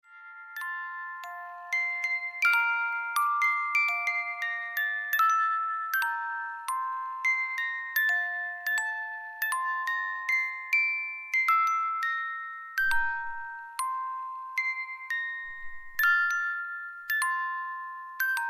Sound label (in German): die spieluhr